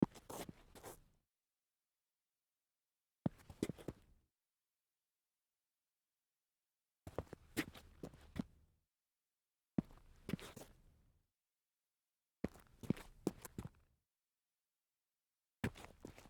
walk turn concrete, foley

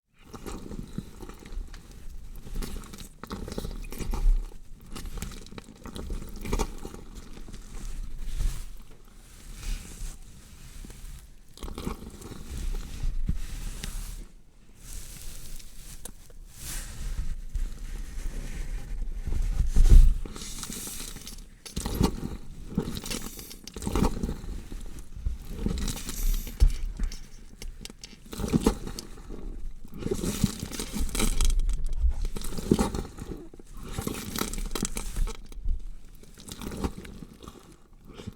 dissecting a body

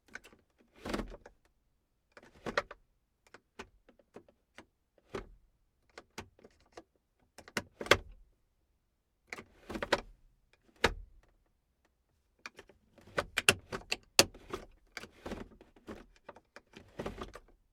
car gears changing

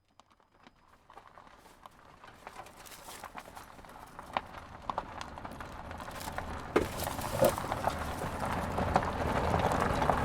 tires rolling on gravel no engine

